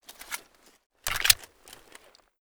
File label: oc33 new magcheck; weapons; Pretty Reanimated Pistols